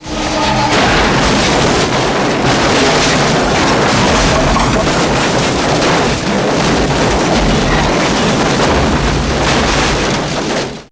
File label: Car Crash; train